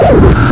gar flame on

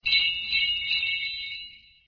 jingle bells

